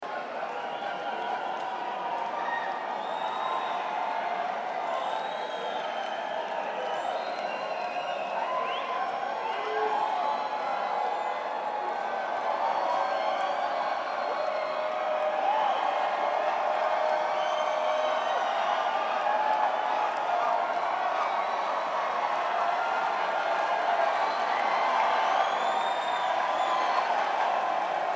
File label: Crowd